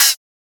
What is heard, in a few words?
Open Hat; FLPRO